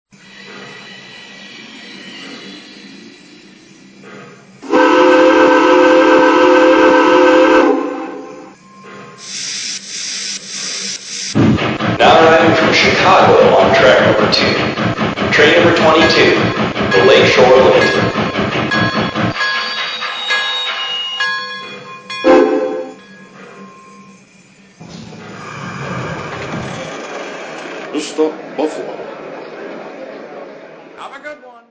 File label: 464 Hudson, trains; NY